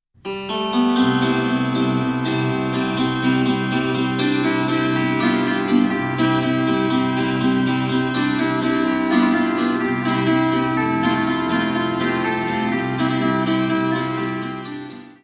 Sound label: polka